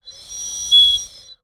Bird whistle, clay, painted, dry, airy, wispy, swell, slip, strong; Eiravaein Works; Vaeyan IV